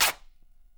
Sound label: RIP, Canvas Bag Rips